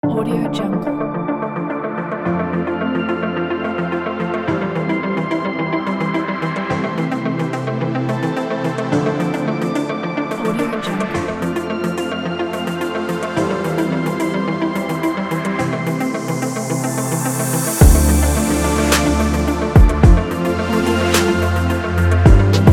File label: ambient abstract technology